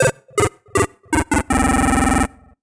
Solo Chip Square Negative; MUSIC EFFECT; startide

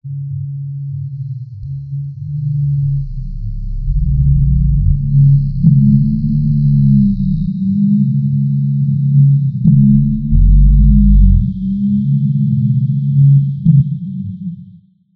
Howl

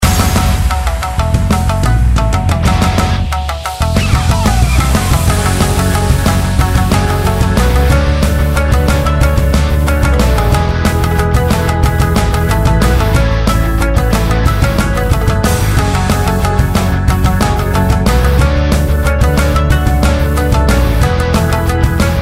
Raid Boss; Mist Train Girls

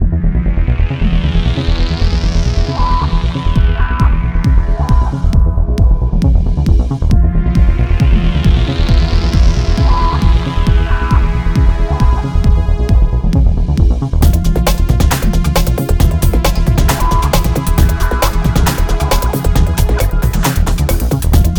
bgm menu; Kinnikuman Muscle Grand Prix Max; ps2

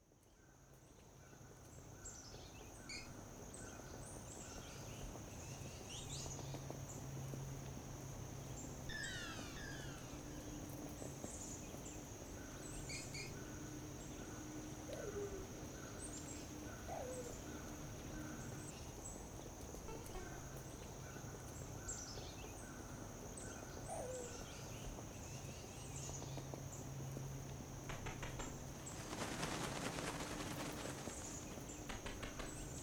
Environmental Ambience; Casual Birder

